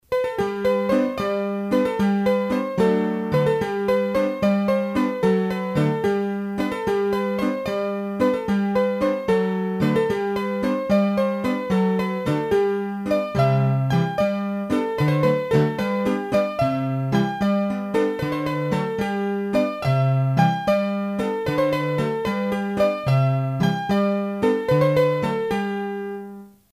Bourree, hurdy gurdy; Bourrees a 3 Temp

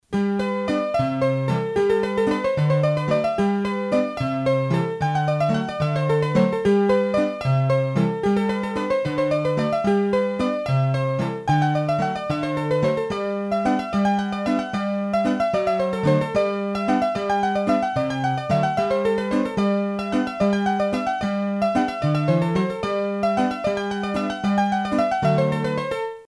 Bourree, hurdy gurdy; Bourrees a 3 Temp

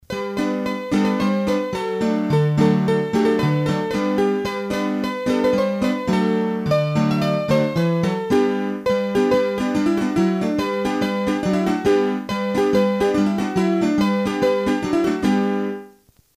Branle, hurdy-gurdy

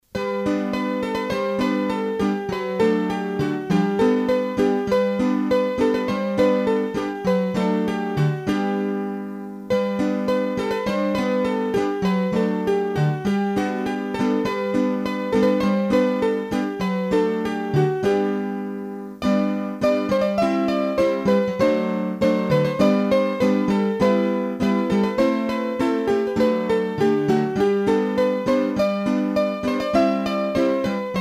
Bourree de Neris, hurdy gurdy; trad; Bourrees a 2 Temp

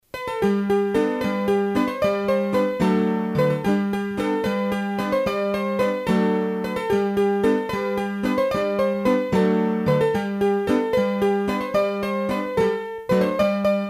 La Bourree Droite d'Issoudun, hurdy gurdy; Bourrees a 3 Temp

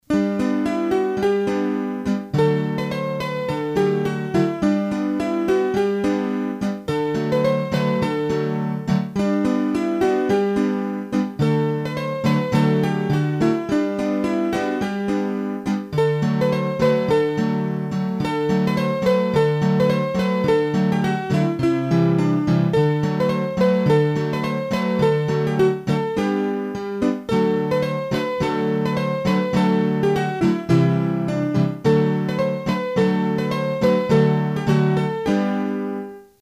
La Bourree des Dindes, hurdy gurdy; Bourrees a 2 Temp

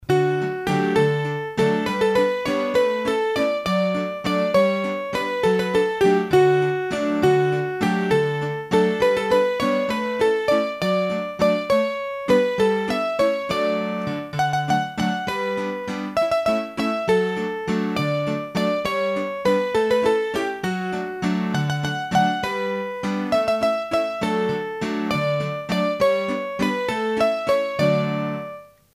Bourree de la Chapelotte, hurdy gurdy; Massif Central; Bourrees a 3 Temp

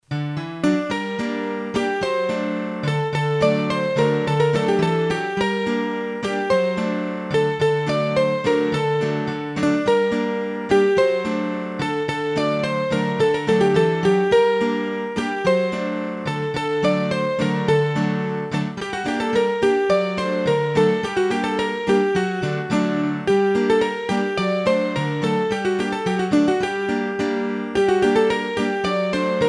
Bourree a Berot, hurdy gurdy; Bourrees a 2 Temp

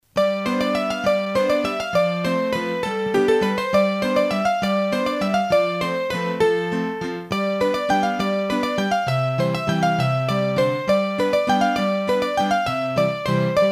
Bourree, hurdy gurdy; Massif Central; Bourrees a 3 Temp

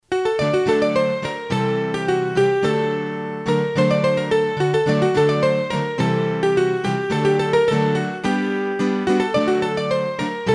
Bourree, hurdy gurdy; Bourrees a 2 Temp

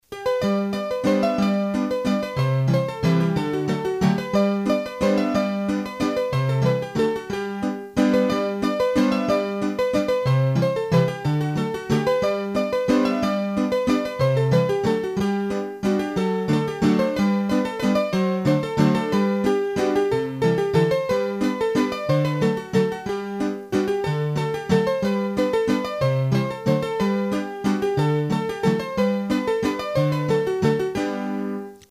Mazurka trad Auvergne, hurdy-gurdy; Mazurkas

